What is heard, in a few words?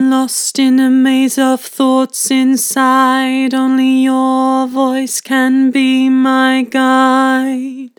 Vibrato, Vocals; Lost in a maze; Harmor Vocals